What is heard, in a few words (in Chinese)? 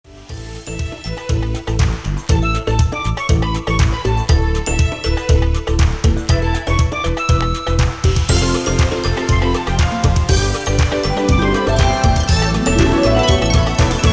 片头音乐